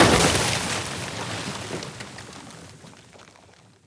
ice break

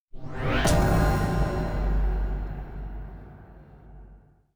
JINGLE Game Over; Fallen Knight

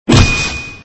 mic impact

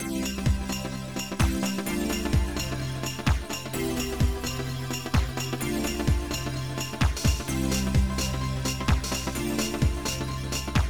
Take the Snow Train Happy; Frozen Bell; NiGHTS into Dreams...; Saturn

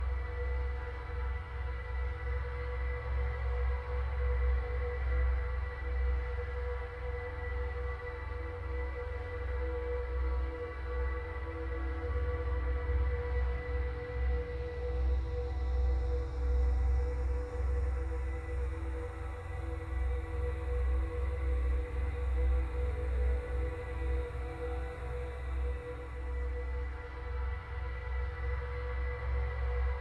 Meteor Pad; Gemini

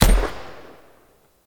chopper shoot; teardown ps5 windows xbox series xs gamerip 2022

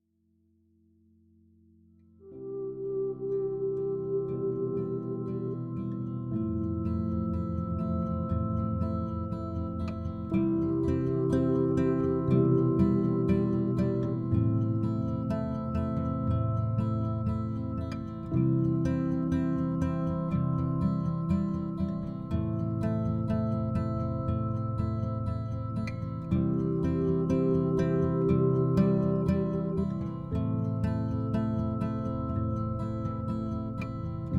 music beach; Beach Extraction

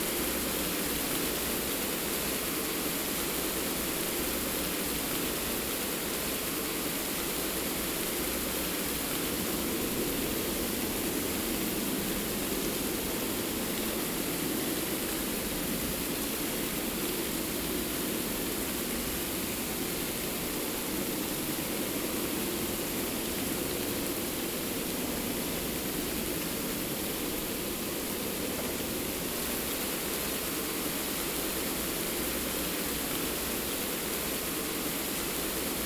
Ambient Rain Outside; Five Nights at Ronald's

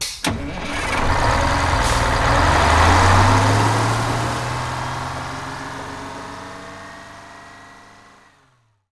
bus; teardown; ps5 windows xbox series xs

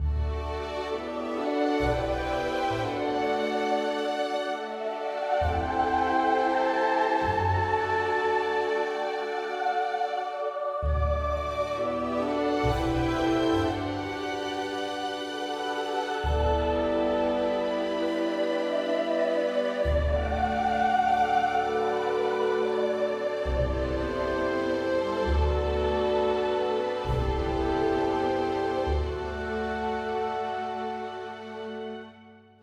Cinematic Music - Peace And Quiet; LittleBigPlanet Karting; PS3

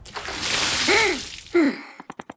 Splash; Talking Tom Cat 2

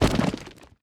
dirt break

